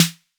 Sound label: 808 snare; SNARES; Wizoo - Platinum 24 Electronic